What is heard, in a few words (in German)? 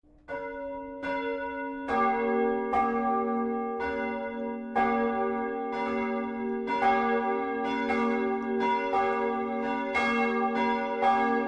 Glocken; Odershausen